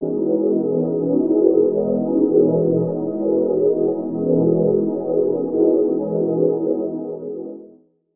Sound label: washy pad